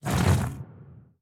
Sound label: biter call behemoth, creatures